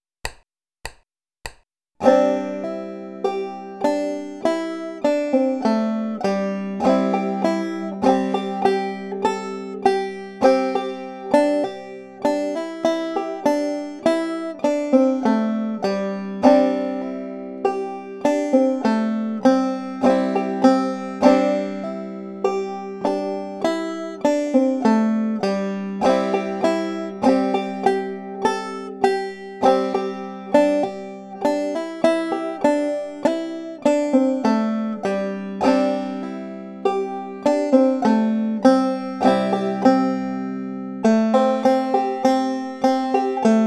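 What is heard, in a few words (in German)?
mit alternating hammer on und pull off, banjo; julianne johnson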